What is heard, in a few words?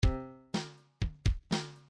bdf midi file grooves rock b rock b